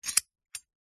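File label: household bolt metal lock or unlock held in hand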